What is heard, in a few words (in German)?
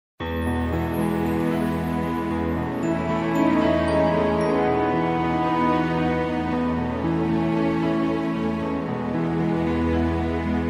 Musik fürs Tiergespräch